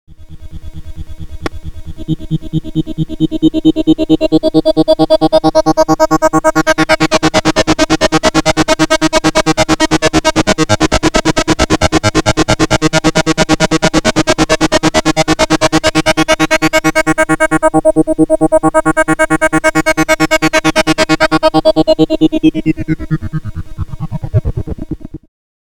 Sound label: ARP Avatar VCF via ext LFO WHEEL